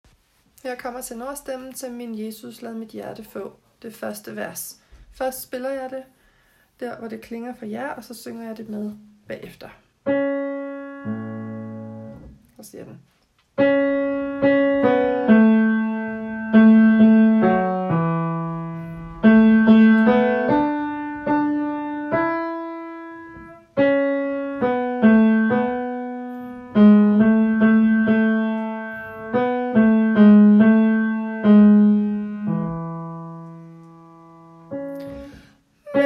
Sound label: tenor